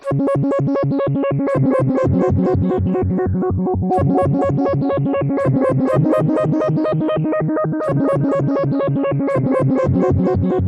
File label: synth arp; Electrocution